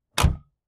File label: SUV gate close, tailgates